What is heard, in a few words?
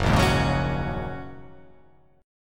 Gb 6th Add 9th Gb down; strummed; Gb6add9; JGuitar